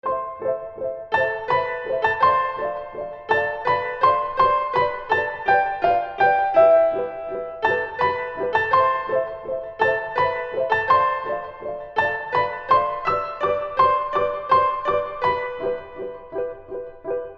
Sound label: waltz piano